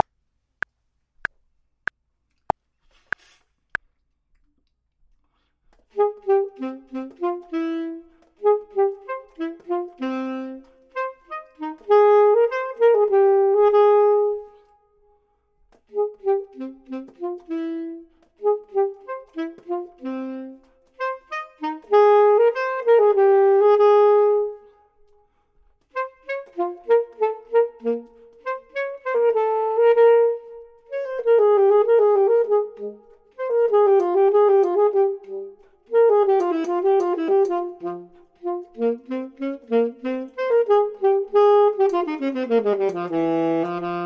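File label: bottom, Saxophone and Clarinet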